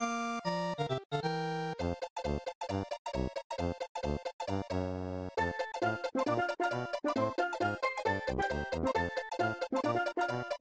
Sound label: Overworld Theme; Ground BGM; Super Mario World; Super Mario Maker